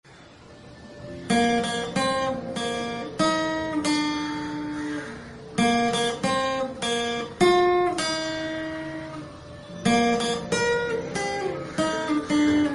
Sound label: Happy Birthday Guitar